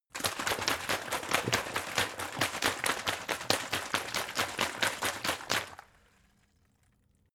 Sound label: bottle water big shake; gastronomie; berufe